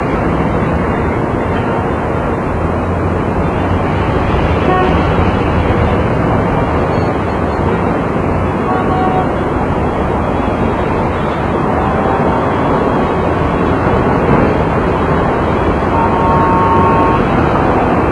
traffic

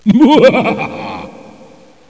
haha

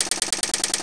typewriter

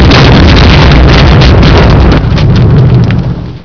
boom

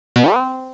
springboard

